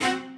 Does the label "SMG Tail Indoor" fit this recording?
No